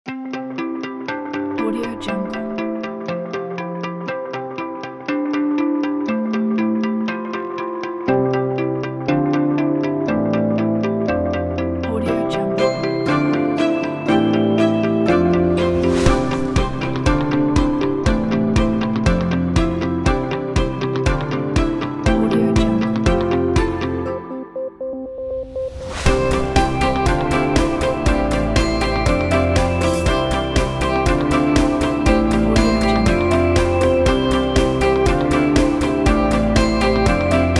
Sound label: summer happiness corporate inspiring